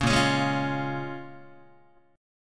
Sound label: Bb Minor 7th, Guitar; Bbm7 strummed